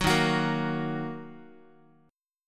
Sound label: D sharp Minor, Guitar; strummed; JGuitar